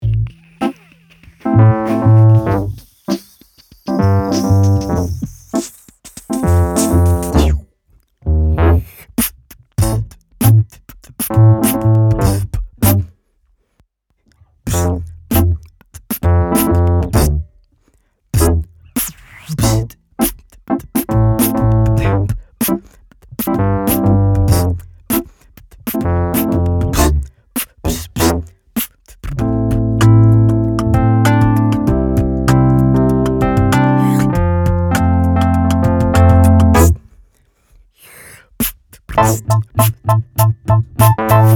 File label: INSTRUMENTAL